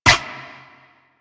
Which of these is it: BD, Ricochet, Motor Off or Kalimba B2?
Ricochet